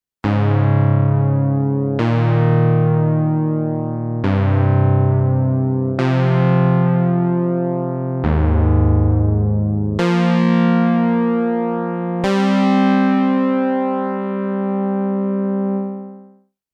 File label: Kobol Waveform Mod